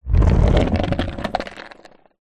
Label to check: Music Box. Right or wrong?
Wrong